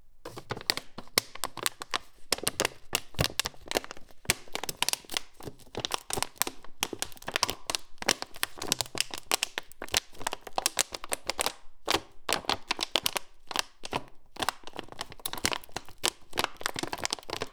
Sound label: field recording